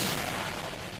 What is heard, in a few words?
explosion